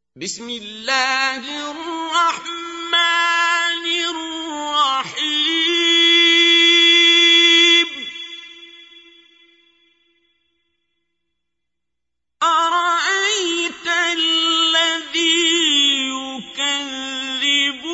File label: Recite, qari quran; Surah Maun; Qari Abdul Basit